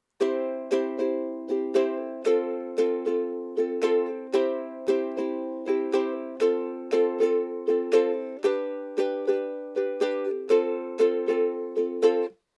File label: foundations slow, ukulele